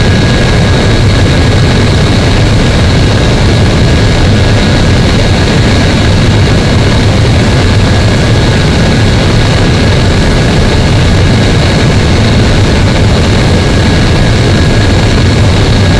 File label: jet engine rpm33